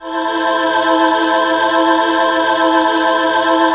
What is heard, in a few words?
Ahh Pad; Zero-G Jungle Warfare 1, 2 & 3; 90 s Sample CDs